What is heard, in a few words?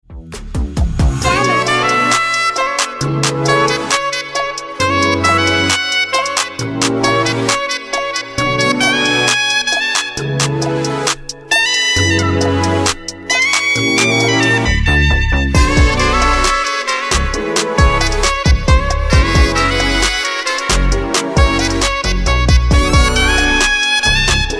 SAX